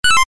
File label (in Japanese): ミス 3連 レトロ